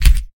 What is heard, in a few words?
Keypress Return, LG Optimus G Pro; LG Optimus G Pro F240K Lollipop